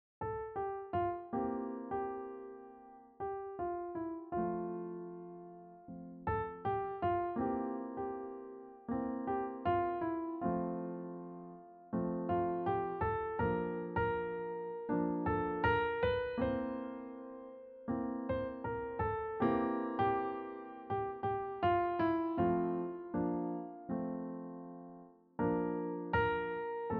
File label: piano solo